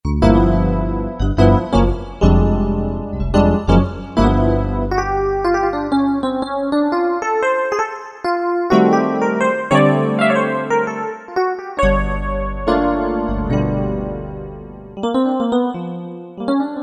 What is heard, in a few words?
E.Piano 3, Korg M1; Factory preset examples